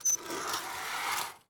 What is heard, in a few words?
Zip Enter